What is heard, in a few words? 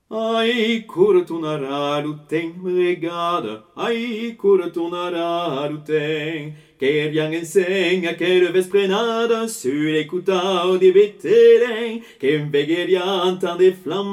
Noel, Provence; Ah-quouro-tournara-lou-tems; N.SABOLY; Ai ! Quouro tournara lou tèms; Ah ! Quand tournera le temps; noël n°4 ou 61; Nicolas SABOLY (1614-1675)